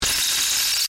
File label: Distortion High; Sound Design; BlastWave